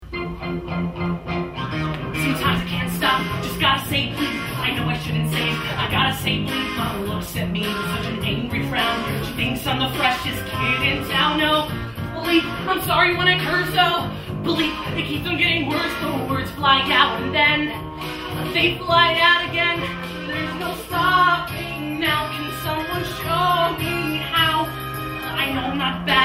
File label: The Bleep Song VOCAL